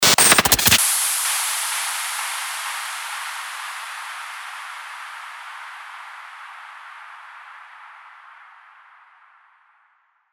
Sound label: STARTER WHOOSH